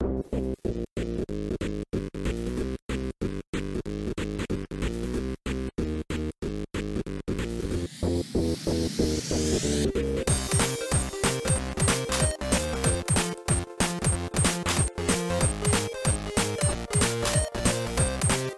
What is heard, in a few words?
Instrumental; Abandoned Arcade Machine; GBC; Slaughter